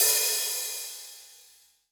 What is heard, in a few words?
OPEN, 30S JAZZ; Northstar - New Gold VOL-1